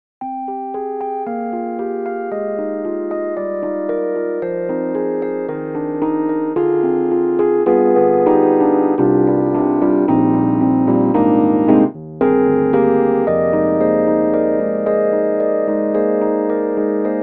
Rhodes; EDIROL; Super Quartet; Joe's Rhodes

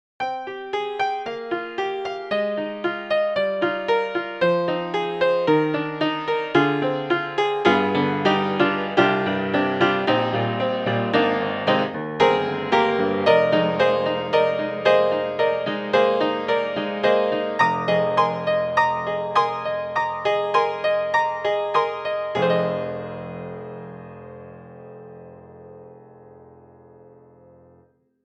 Upright Piano; Native Instruments; Upright Wunderkind

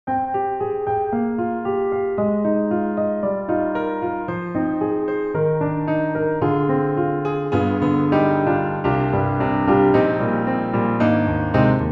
Fazioli F308 Bright; EastWest; Quantum Leap Goliath

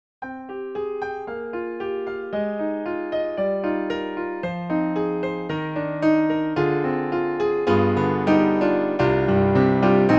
Natural Grand

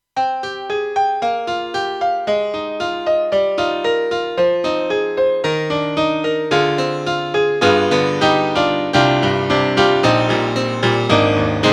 AKAI SG01k Pf

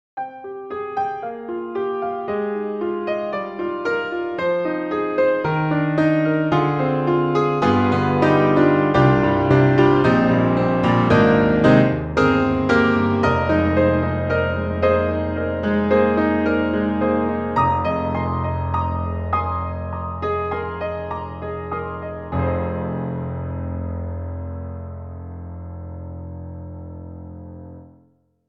Classical Piano; BEST SERVICE; COMPLETE ORCHESTRAL COLLECTION

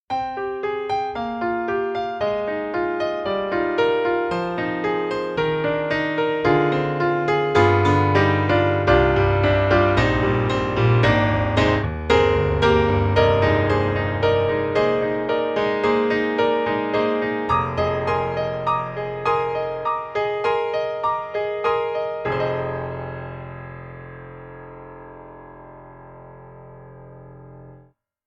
Italian Grand Close Mic; VI Labs; True Keys

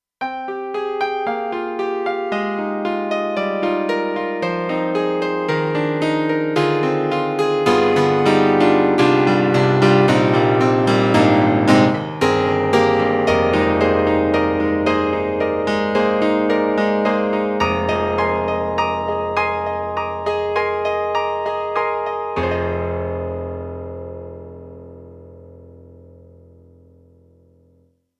Piano; Roland; SC-8850

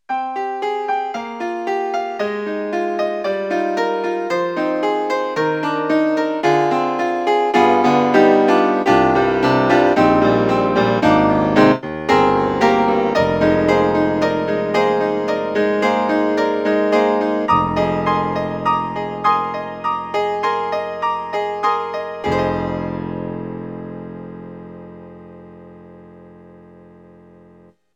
Wavetable Synth, General MIDI, Bright Piano; SoundMAX

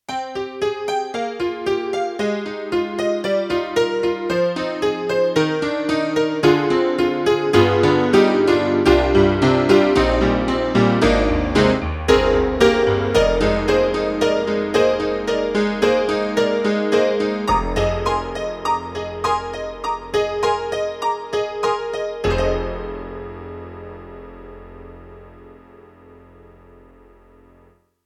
YAMAHA MDP-5 GS a.piano

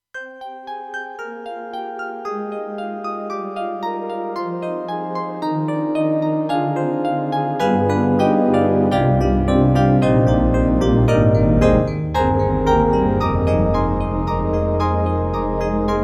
Yamaha TG300 GM-B EP Pad

